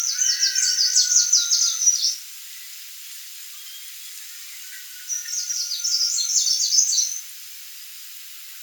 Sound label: luiverdastro song; Greenish Warbler; Phylloscopus trochiloides; Povegliano, Treviso, Italy